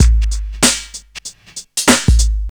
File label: 96 bpm drum groove g sharp key